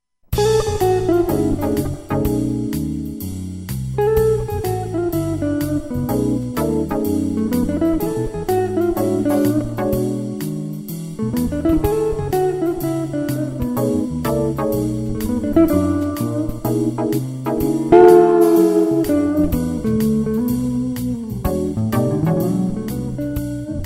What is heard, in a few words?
blues